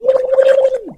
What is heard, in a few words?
hurt vo; otis